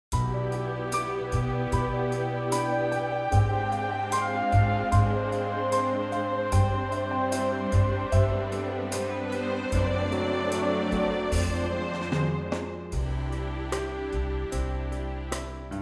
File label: C orch